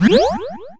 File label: Recover; easyrpg; Ib